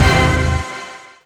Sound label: Bad Orch Hit; Jungle; Blu Mar Ten - Jungle Jungle - 1989 to 1999 Samplepack